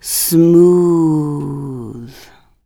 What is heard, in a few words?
Snake